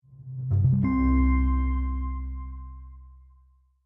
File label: homepod setup target